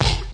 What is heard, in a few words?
flobby bd; Kicks; single hits